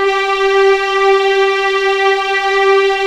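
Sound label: G4 legato F R, string; East West; The Ultimate String Collection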